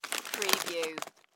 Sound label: Potato Chips Bag Pick Up